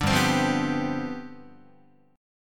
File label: G 11th, Guitar; strummed